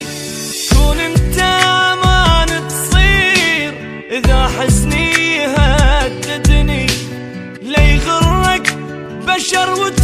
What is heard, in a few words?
sad iraqi ringtone